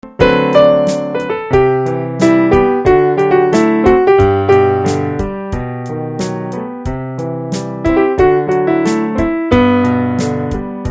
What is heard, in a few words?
piano G